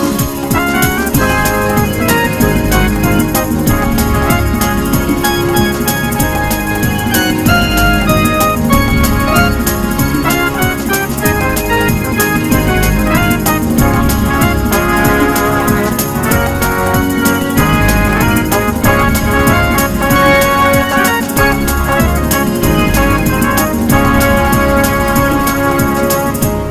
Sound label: Hot Country Stepping